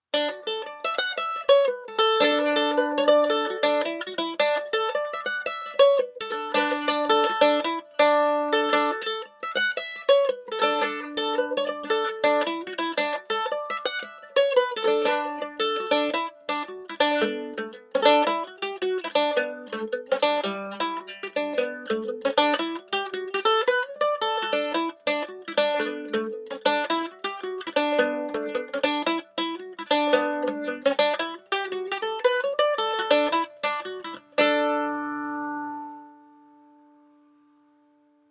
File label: Mandolin Pick Comparison